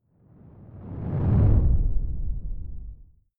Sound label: whoosh simple low grainy; swooshes-swishes